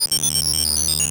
Science Fiction Sci-Fi Electronic Computer Readout